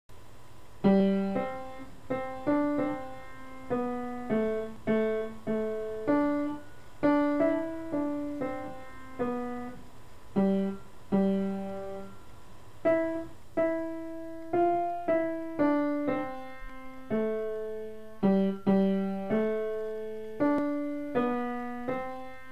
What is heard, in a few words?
piano